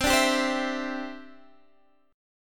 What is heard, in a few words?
E Minor 7th Sharp 5th C